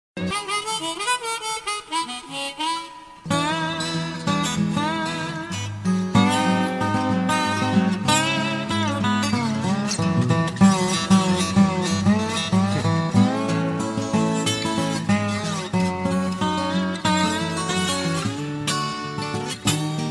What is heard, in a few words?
blues